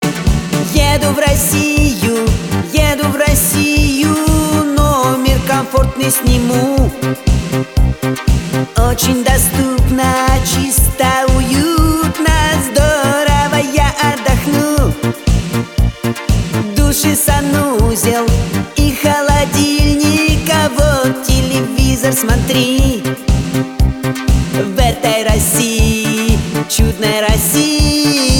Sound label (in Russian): Музыкальный ролик - Гостиница